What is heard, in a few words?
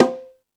Latin Drums; E-MU Pro-Cussion